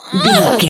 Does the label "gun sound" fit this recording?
No